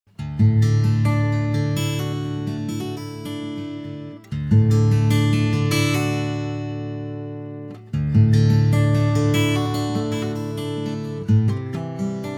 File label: Guitar